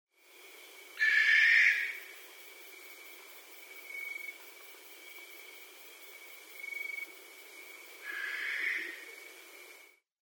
barn owl

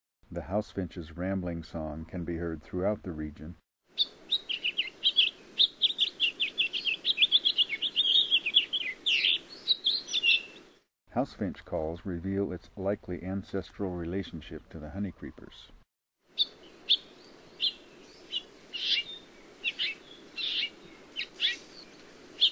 House Finch; Voices Kauai

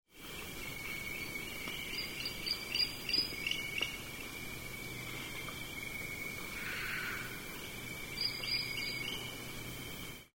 Barn Owl mandibles

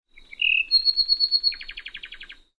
hawaii apapane

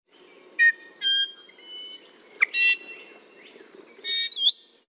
hawaii iiwi

